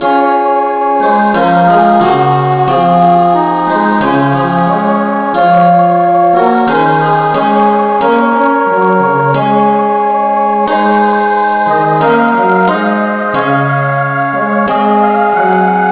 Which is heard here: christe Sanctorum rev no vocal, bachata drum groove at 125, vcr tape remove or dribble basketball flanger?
christe Sanctorum rev no vocal